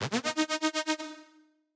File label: Chord Hit; Remixlive; Dancefloor Pop